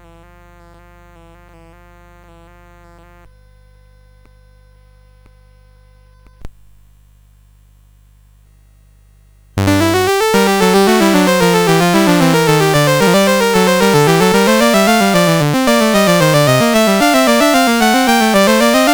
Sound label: Two Part Invention, CSG8580; CSG8580R5